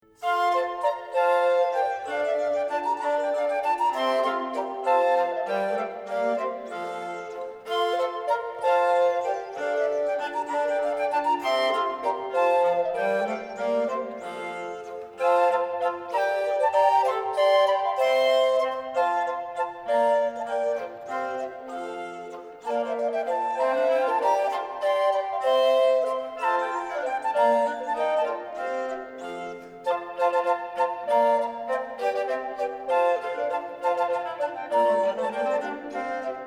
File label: recorders galliard; laroque; phalese 1571